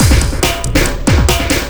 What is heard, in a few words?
Beat, Drums & Percussion; Electrocution; Beats